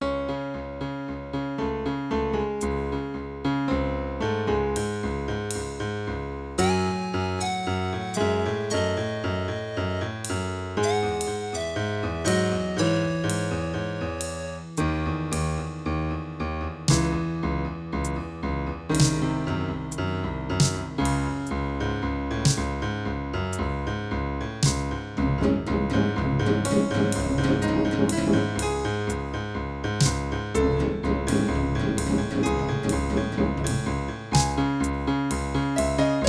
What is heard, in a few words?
Drums; Soundtrack 2